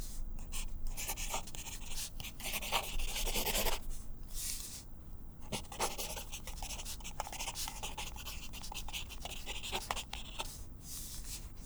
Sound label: Mech Pencil Writing; bb - Books, Paper, Writing